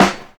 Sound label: Smooth Bottom End Steel Snare Drum Sample G Key